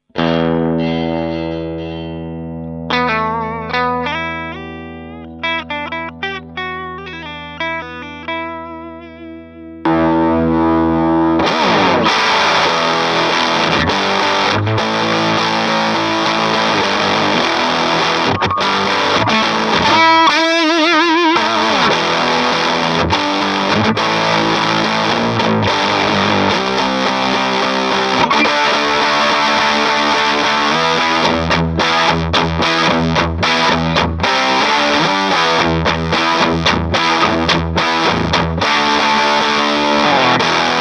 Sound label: Fuz Box